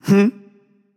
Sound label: notice; smite; thanatos